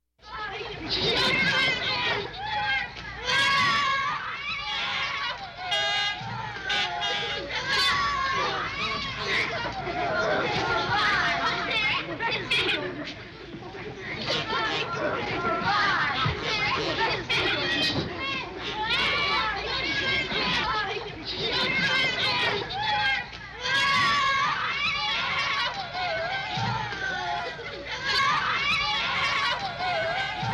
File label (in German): lachende kinder